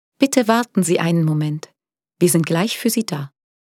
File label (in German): telefonpaket warteschleife